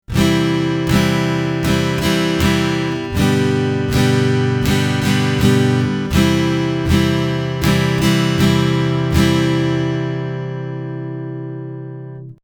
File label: easy strum, acoustic guitar